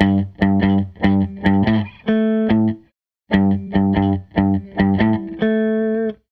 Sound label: Les Guitar Loop